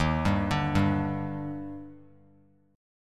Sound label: D sharp Major 7th, Bass Standard; arpeggiated; JGuitar